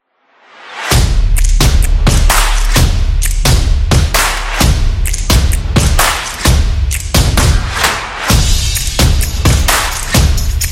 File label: Stomp